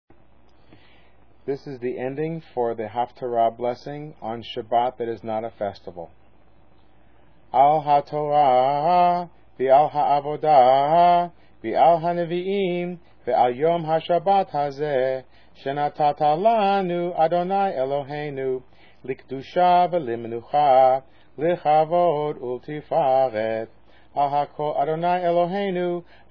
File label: haftarah post blessing shabbat; Music Shabbat Morning